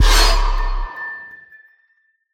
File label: Jump Scare